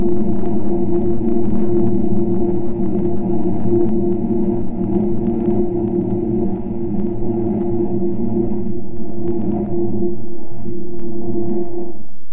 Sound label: Insane Noise